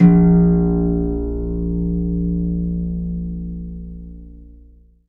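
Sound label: HARP C2, Strings; ILIO; Synclavier Strings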